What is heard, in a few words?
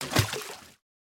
Water Down; Folk Hero